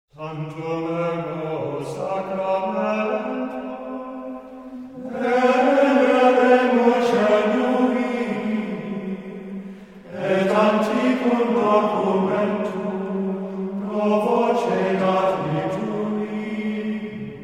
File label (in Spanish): canto gregoriano - Tantum Ergo; Catholic Music with the Holy Rosary; Catholic chants and songs